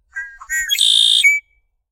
Wetland blackbird; children of morta; Home

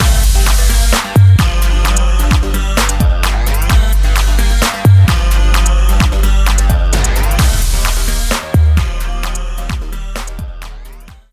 Stinger; Urban Central

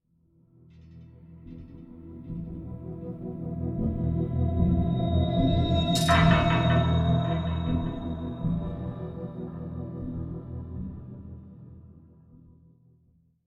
underground ambient, music, mystery; You Are Empty

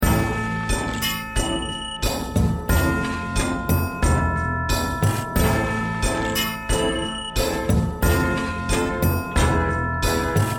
bgm zone village; zone5; goken

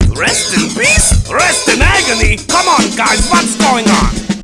Exiting Cool Mode

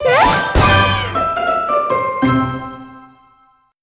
Crunchy Finish

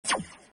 enemy spit sound; Arc of Templar

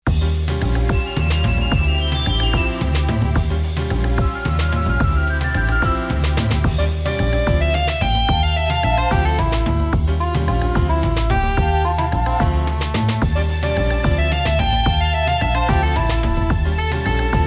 Waltz; Silhouette Mirage original soundtrack

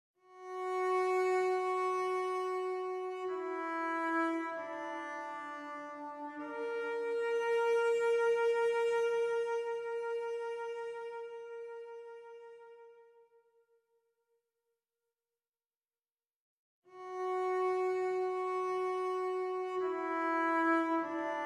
New Dream Cello; Hollow Knight

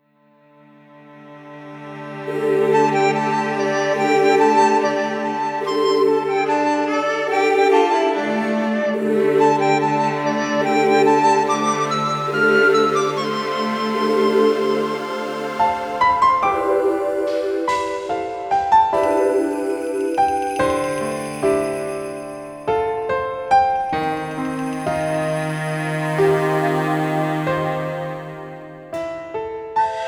Moonlight Piano; Labyrinth of Refrain Coven of Dusk

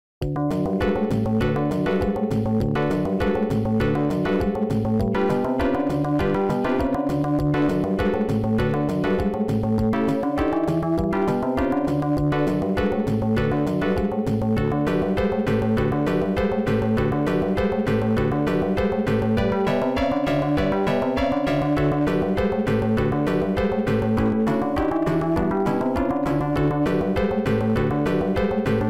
stage music; Ma Cheong Ru; arcade

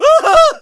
Scared Male; friday the 13th killer puzzle

